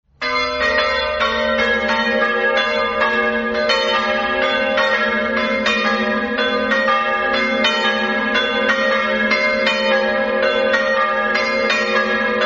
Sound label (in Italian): campane; stello